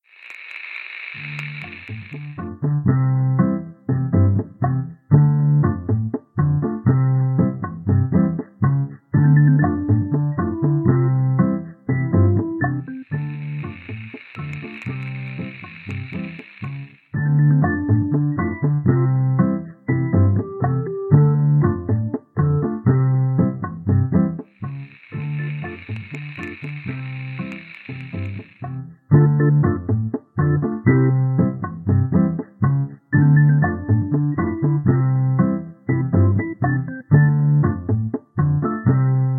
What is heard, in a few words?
Salsa; Rogue Shooter: The FPS Roguelike; Potato Salsa